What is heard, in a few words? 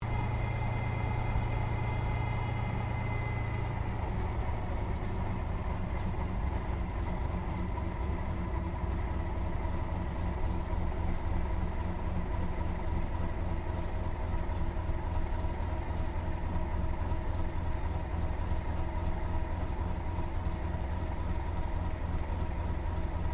AIRCRAFT P-40 KITTYHAWK TAXI INTERIOR; Warhawk / Tomahawk; Airplane; Vehicles